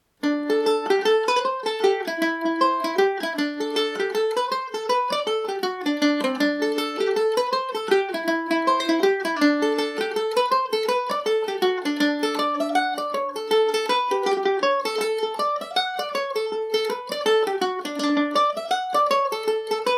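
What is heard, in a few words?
reel D mixolydian, Irish mandolin; road to lisdoonvarna reel revisited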